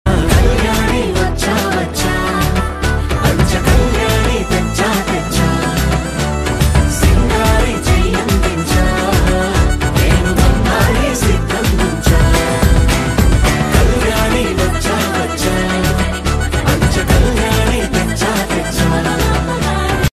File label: song ringtone telugu song ringtone